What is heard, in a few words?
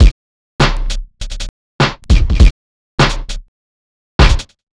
Urban Beat, Drums